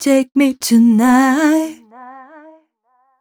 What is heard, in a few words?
f mel c, fem Vocals